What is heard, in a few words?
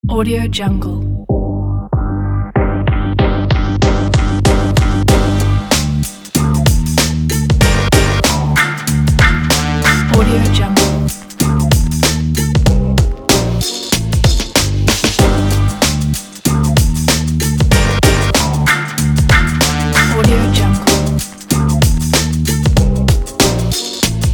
background old school hiphop